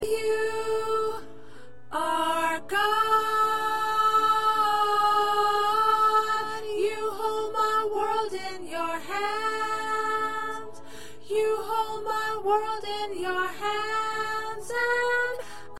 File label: P Soprano